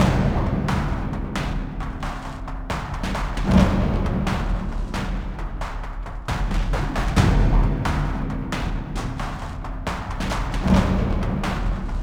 Training Drums; dying light gamerip 2015

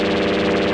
FM Beam loop

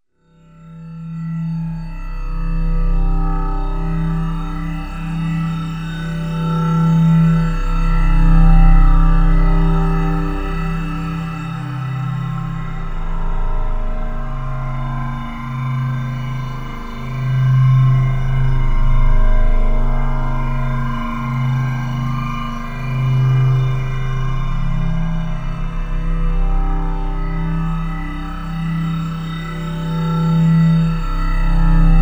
Ambience; Black Mesa

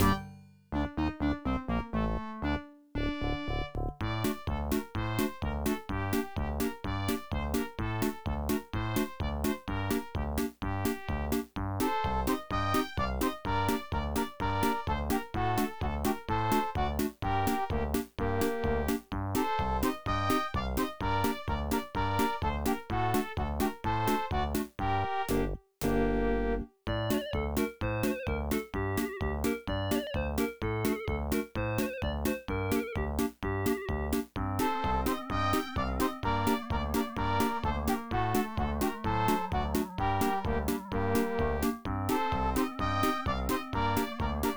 Russian Folk Song; tokimeki memorial forever with you; ibm pc at; Korobuchaka

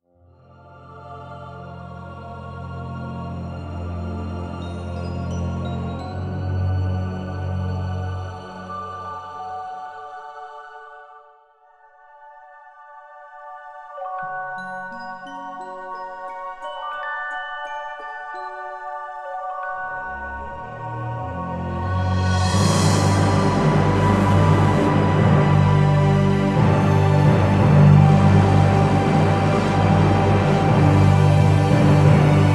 Jingle Open Treasure Chest; Jack Keane

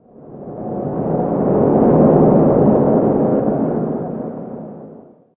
Ambient

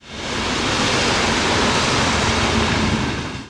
train; lego ninjago rise of the snakes

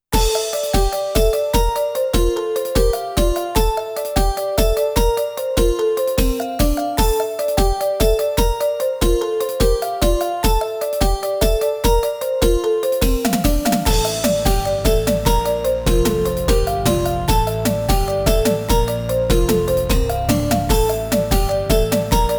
NINE BEAT, SC-88; Crystal Rinal; PC-9801

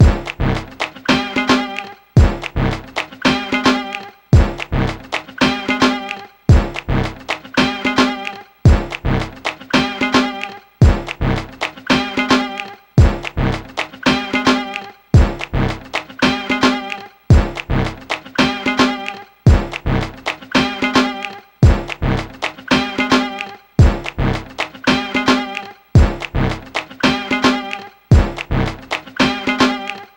Loading Song Loop; NBA Live 2005; GC

